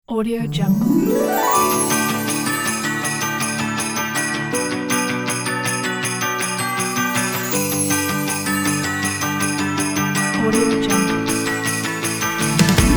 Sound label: positive happy christmas upbeat kit